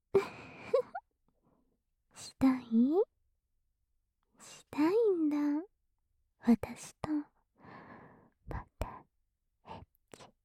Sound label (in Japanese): 耳舐めと尻尾で両耳 ぐちゅぐちゅ; 耳舐めと尻尾で両耳犯しぐちゅぐちゅエッチ